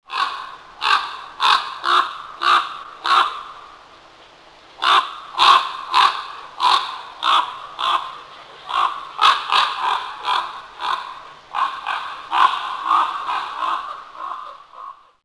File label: Common Raven